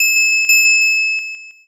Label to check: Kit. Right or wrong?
Wrong